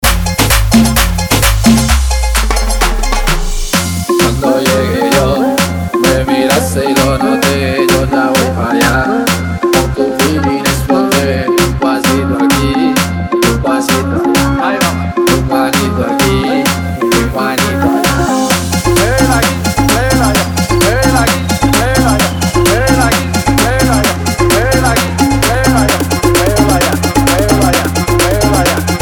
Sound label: Salsa Remix 130 BPM